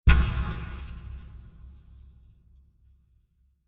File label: Deep Scratch Impact Blast; Impacts; Crafted Signals